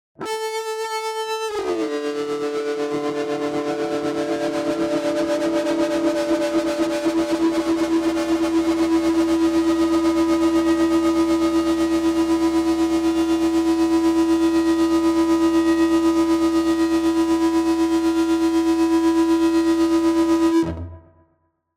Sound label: Rock Guitar Distorted - Long Sustain Tone; Hollywood Edge; sound designer's toolkit v2